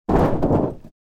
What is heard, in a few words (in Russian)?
ob kapot